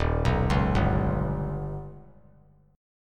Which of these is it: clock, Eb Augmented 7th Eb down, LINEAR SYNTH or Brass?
Eb Augmented 7th Eb down